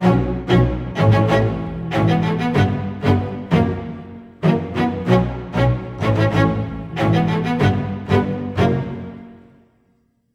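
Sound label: b minor, Low Strings, 95; PowerFX - Downtown Orchestra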